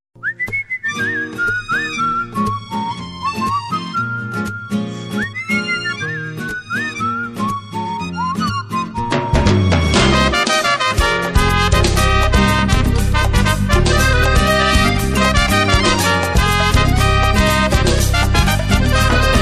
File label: sine